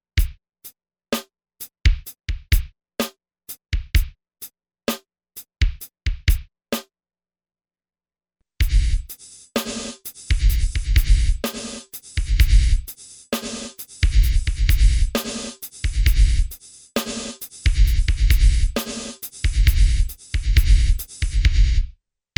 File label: NON LINEAR